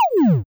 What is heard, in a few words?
fall sword fall